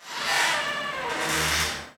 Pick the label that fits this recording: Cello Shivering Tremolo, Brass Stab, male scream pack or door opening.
door opening